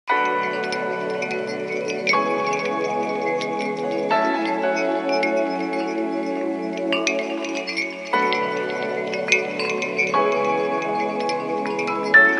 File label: dharma bells; Nokia; 8800 CD